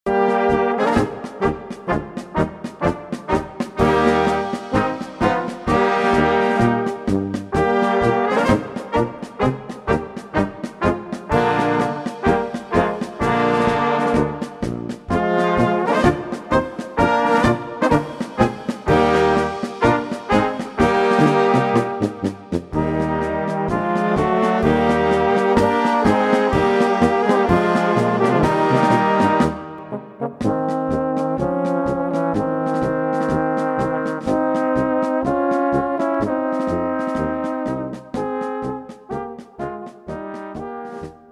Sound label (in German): Polka